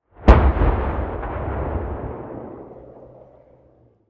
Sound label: distant expl